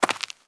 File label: Walk